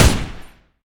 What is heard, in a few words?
uzi fire, weapons